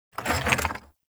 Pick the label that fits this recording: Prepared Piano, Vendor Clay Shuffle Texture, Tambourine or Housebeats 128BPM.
Vendor Clay Shuffle Texture